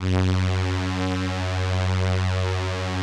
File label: VP-330, String Machines